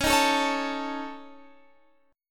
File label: C sharp Suspended 2nd Sharp 5th, C sharp